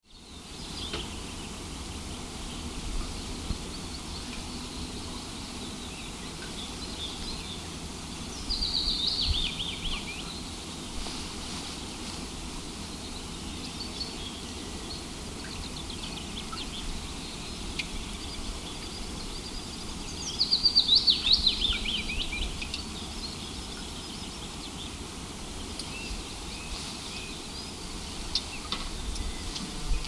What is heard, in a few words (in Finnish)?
Linnunlaulu ja tuuli